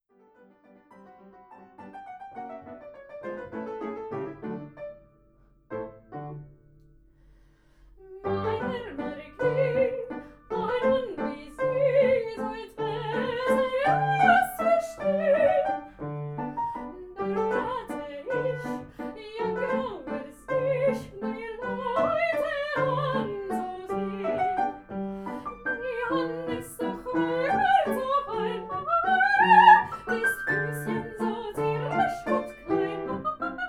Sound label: Strauss Laughing Song